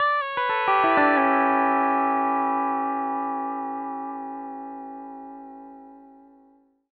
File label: PD LOOPS 120, Whole Lotta Country